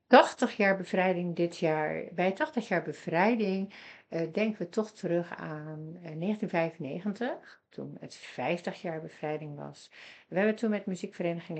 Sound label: massed band; 1995; 80 jaar vrij